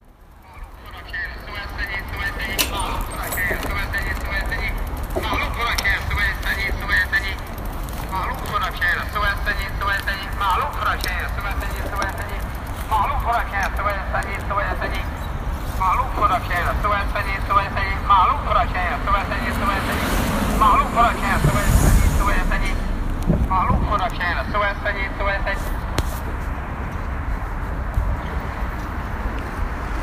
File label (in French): shanghai est un vendeur à la sauvette